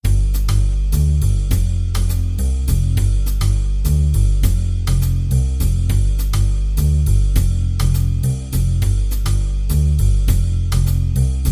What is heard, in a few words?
solos FAST